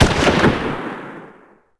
Rifle Fire; Civ4 warlords

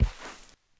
Animal Foot Large Sand; Civ4 warlords

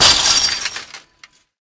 fence metal small crash

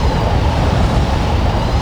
in air loop intense

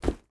ui drop leather; Rust

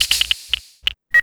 adrenaline end

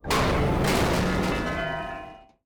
objects, World of Tanks

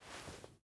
ironsights down; Rust

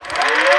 minigun spinup; Modern Warfare

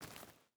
footstep barefoot forest walk; Rust

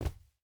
footstep hide carpet jump land; Rust

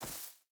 footstep burlap grass run; Rust

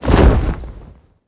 Aircrafts Plane Chute; DCS

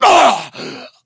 pain

